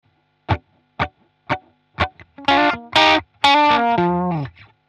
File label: blues, guitar